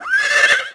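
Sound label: Whine, horse; Epona whining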